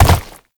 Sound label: Land Flesh, Movement; Warframe; Uriel